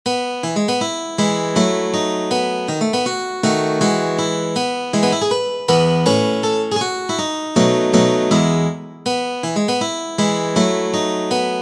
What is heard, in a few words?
Waltz